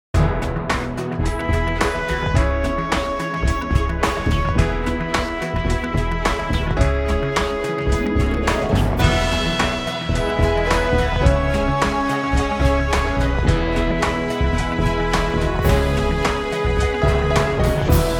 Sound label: corporate